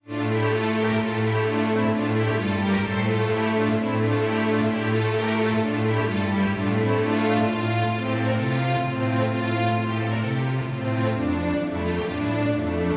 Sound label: Allegro for String Quartet in F major